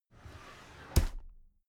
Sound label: drawer cabinet carton open regular